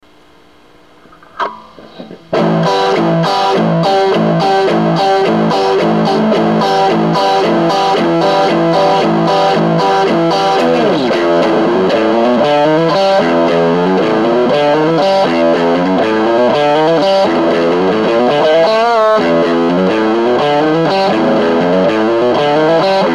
Guitar Riff

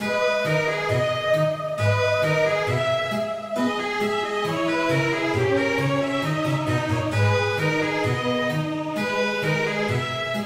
Baroque Sarabande

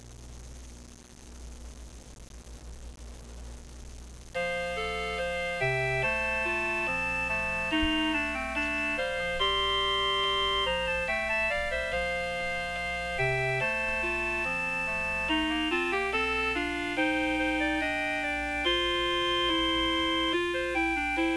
Trio

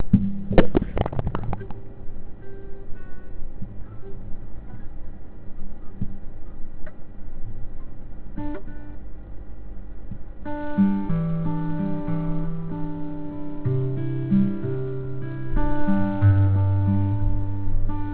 another random guitar thingy

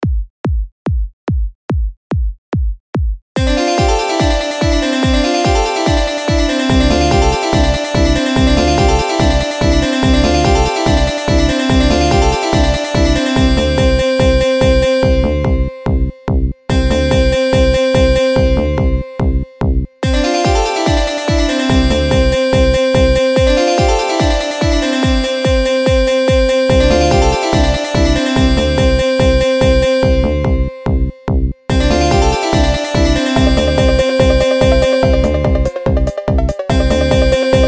Electronic; FL Practise

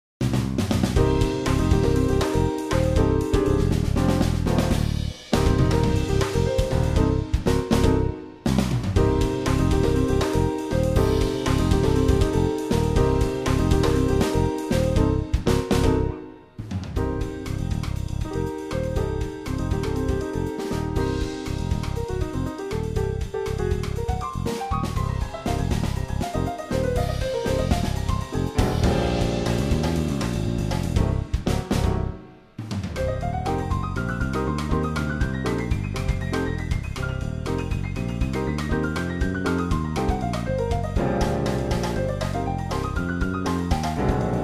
Jazz Tune

